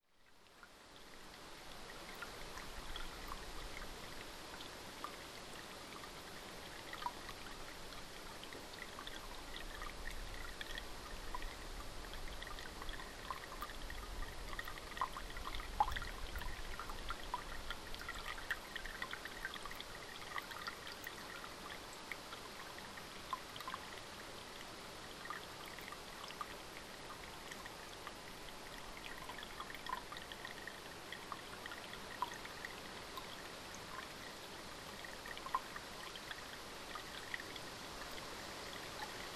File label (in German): rinnsal